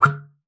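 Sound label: C#4, bass flute; Xsample VOL-2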